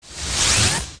Ball Throw; Phantom Ganon; OOT

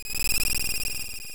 Essence Spin; Zelda Oracle

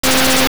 Shock; Link getting an electric shock; Oracle

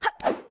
Link Roll; Link rolling; The Wind Waker; Zelda

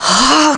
Victory; Sheik's victory pose

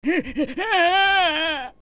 Talon Run Away; OOT

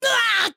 Damage

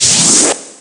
Vanish Prepare; Sheik vanishing (same move as Zelda's teleport)

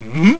Hmm; King Daltus: "Hmm?"

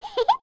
Baby Goron Giggle

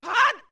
Shout; Zora Link's shouts when rolling or attacking

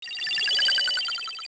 Return To Normal; Things returning to normal in both games' endings (statues coming to life in OOA, seasons restoring in OOS)

